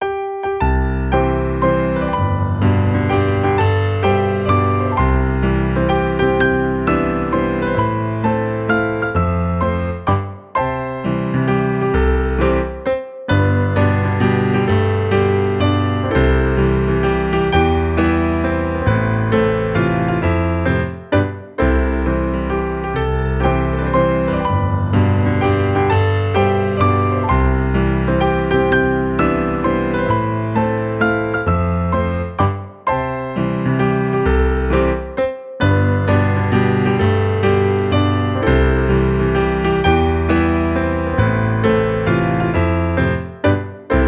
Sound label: Happy Birthday - Piano Version